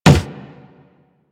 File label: Run Wood, Footsteps; Warframe; Operator